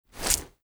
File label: foley chemlight toss